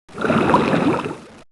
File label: Water Elemental Defend Sound; Heroes3